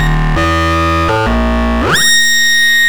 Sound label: Synth Pattern; Electrocution